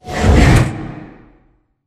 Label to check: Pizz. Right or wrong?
Wrong